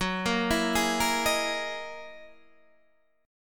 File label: F sharp 13th F sharp down Guitar DADGAD